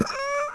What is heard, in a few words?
strain; Shadow Man